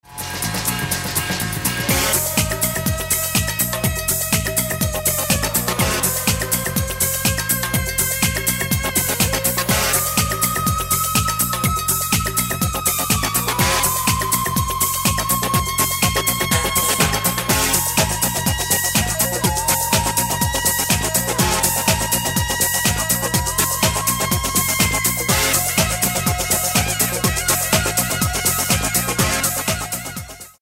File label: Electric Guitar Superwound